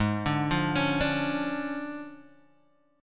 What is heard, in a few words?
D Minor Major 7th Flat 5th, G sharp